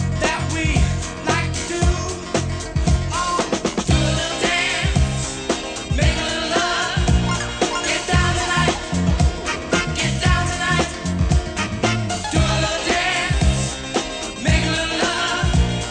disco

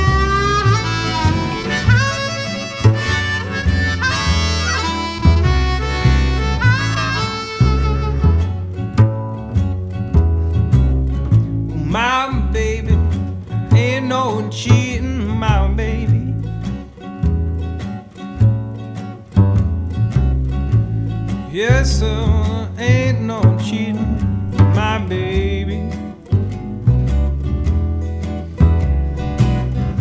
blues

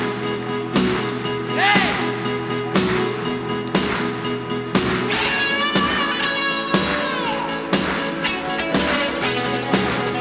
rock

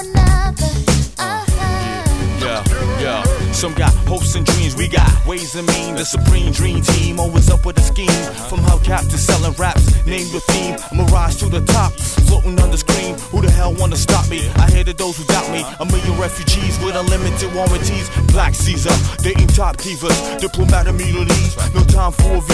reggae

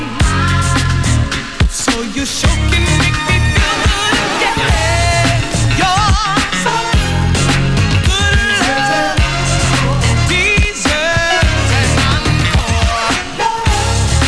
disco

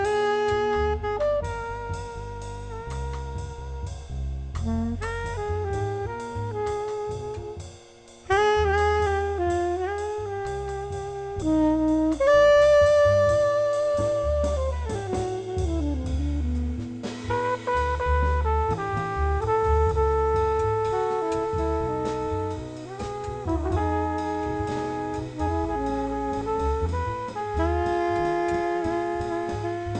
jazz

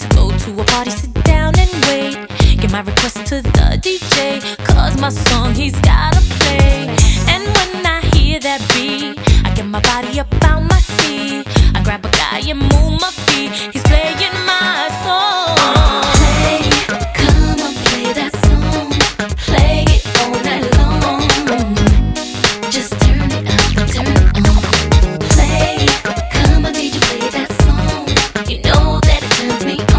pop

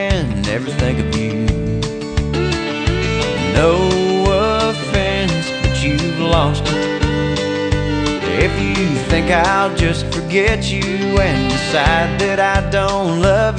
country

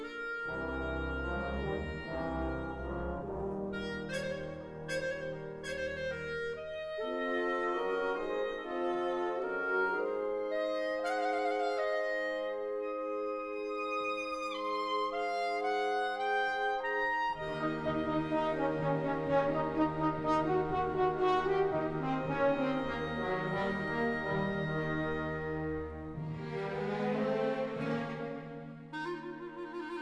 classical

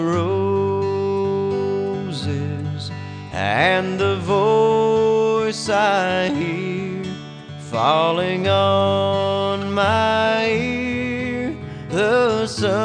country

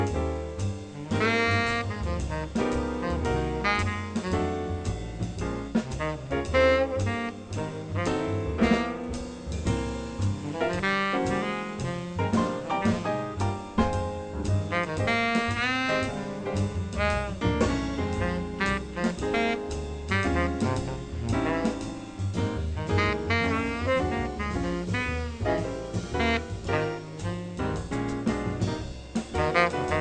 jazz

